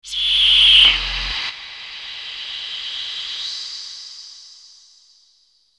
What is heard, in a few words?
Aliens Monsters; FXHome